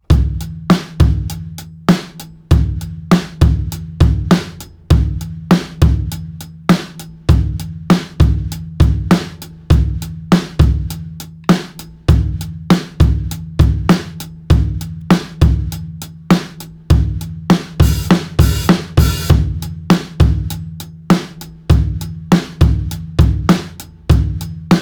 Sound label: 100 bpm